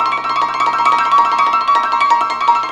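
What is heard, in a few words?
ZIMBAL MELODY; Best Service; World Colours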